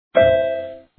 COIN DROP; Jogos de Azar; fruit

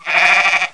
BAAAAH, animais

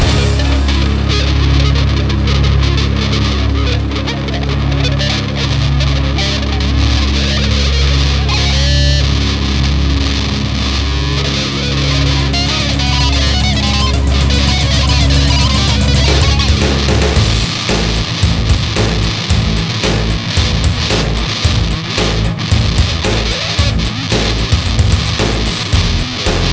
ROCK

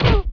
punch; BESTSHOT; noisybit

